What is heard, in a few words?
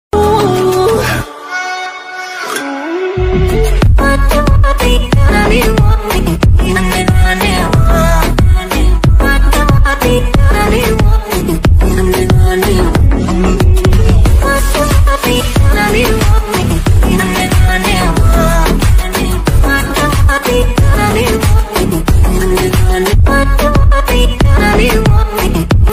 love bgm ringtone tamil ringtone love bgm ringtone flute ringtone